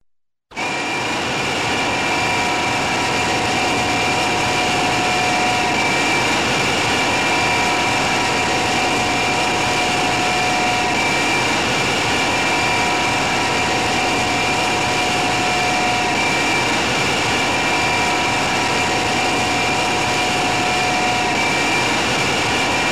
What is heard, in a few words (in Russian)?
generatornij zal na proizvodstve